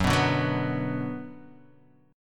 Gb Suspended 4th Sharp 5th Gb, down, Guitar, Half Step Down